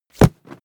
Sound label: body fall generic mid tumble; krieg-waffen; nahkampf; soundpool